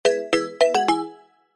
multimedia alert mallet and chime completed successfully